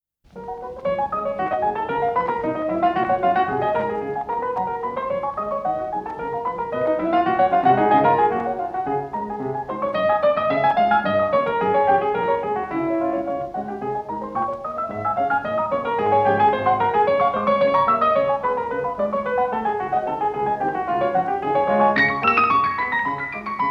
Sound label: Etude Op25; Chopin